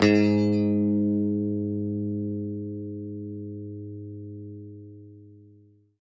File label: g sharp, guitar